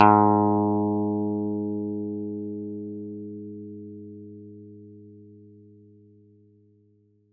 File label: Bass Guitar Single G A Note